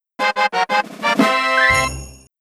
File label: Power Up Win